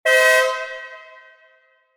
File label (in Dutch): Brandweer Toeter